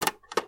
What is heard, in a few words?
clicked ok